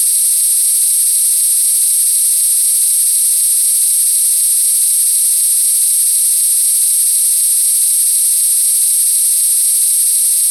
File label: voice HIGH